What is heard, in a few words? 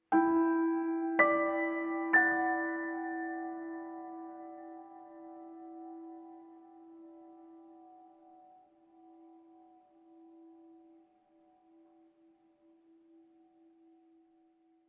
tune